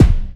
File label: raw bass drum sample g sharp key